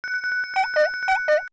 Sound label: Alert; Samsung; SGH-i310